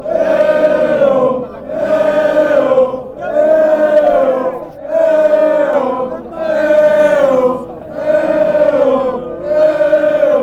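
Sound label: fanchants, football chants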